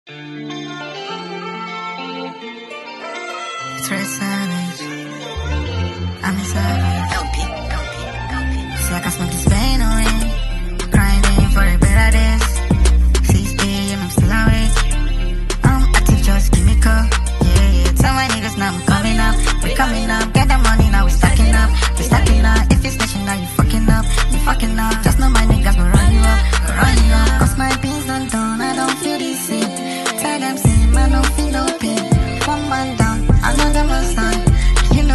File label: Sped Up